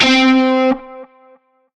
Shawzin Oct Three C; Music; Warframe; Narmer Shawzin

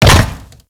Grineer Land Metal, Footsteps; Warframe

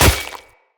Dagger Impact Flesh; Weapon Impacts; Daggers; Dendrit Dagger; Combat

